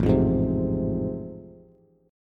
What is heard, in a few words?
A Minor Major 7th Sharp 5th A Bass, chord; AmM7#5 strummed; 5,8,6,5